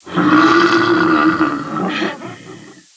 alien; A NEW HOPE

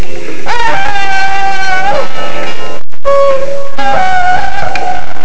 scream; Sombra's classic scream

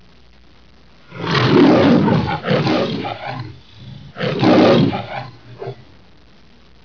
lion

